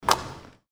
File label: basketball scoring